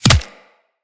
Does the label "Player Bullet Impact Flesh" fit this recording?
Yes